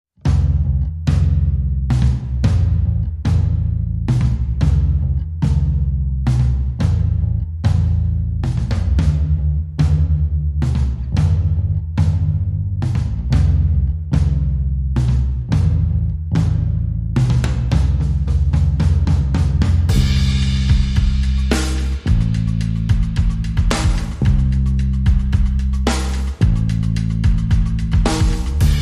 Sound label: Drums and Bass Mix